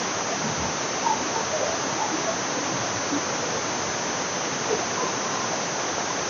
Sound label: bat scan; audacity gps